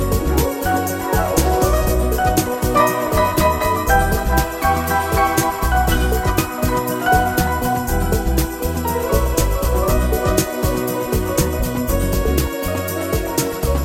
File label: Latin Smooth Jazz